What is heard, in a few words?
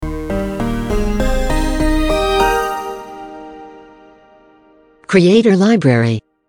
Audio Logo